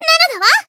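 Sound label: Voice attack, Japanese; thillelille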